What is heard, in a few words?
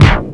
Misc Scratch, Drum Machines